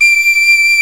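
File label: penny whistle VIB D3; Exotic Winds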